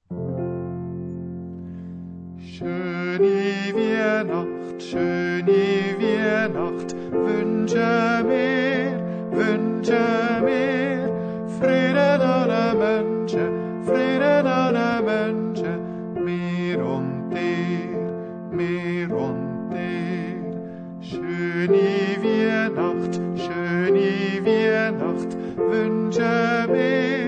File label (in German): Schöni Wiehnacht (Kanon)